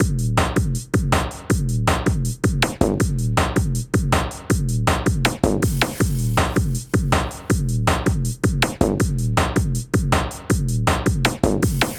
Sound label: Club Dance Beat